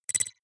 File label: noise flick splutter; maschinen; signale; soundpool